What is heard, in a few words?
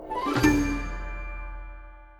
User Message Success; rise of venice windows gamerip 2013